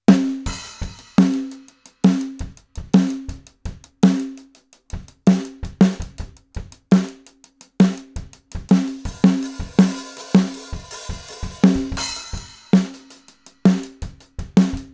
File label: groove